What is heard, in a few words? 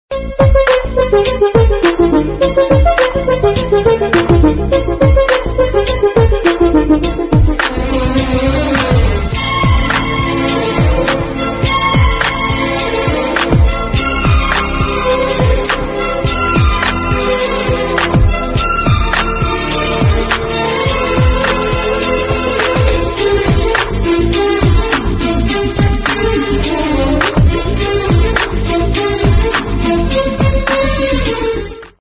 climax bgm; pokiri movie